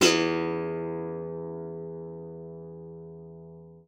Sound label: E2, GUITAR; Xsample VOL-2